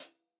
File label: HI HAT TOP TOP HI HAT; Sonimus; n series drum pack